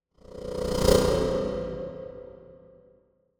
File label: Whoosh Stutter Fast Pulsate Fear Pass; Hollywood Edge; sound designer's toolkit v2